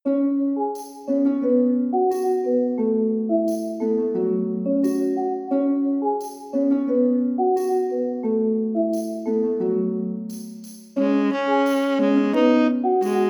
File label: interlude for two saxes harp marimba and tambourine